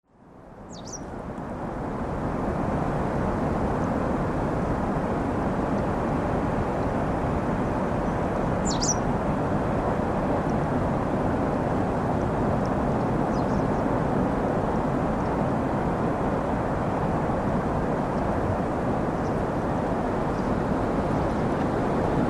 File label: Aberavon Soundscape